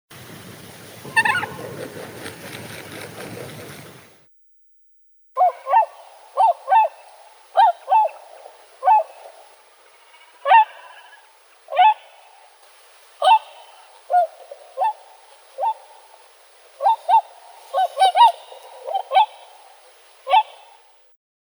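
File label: Bewick's swan, Cygnus bewickii; lebed malii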